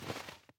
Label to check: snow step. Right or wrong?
Right